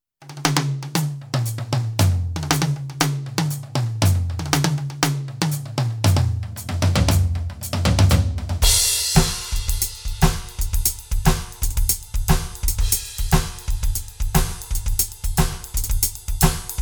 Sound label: ROLAND TD 17 KVX Rock